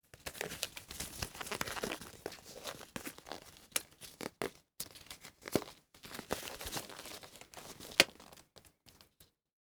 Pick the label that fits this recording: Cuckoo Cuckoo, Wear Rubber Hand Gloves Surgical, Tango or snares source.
Wear Rubber Hand Gloves Surgical